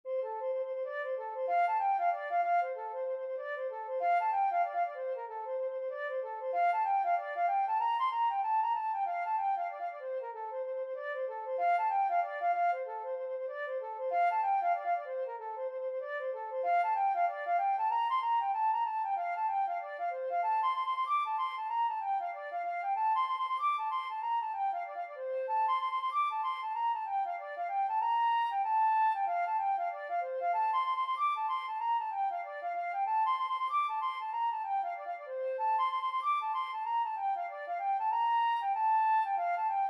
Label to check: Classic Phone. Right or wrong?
Wrong